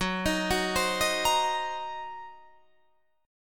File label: Fsharp 6th Flat 5th down Guitar Drop D